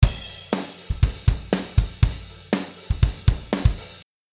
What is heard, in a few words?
Mini V Efx dry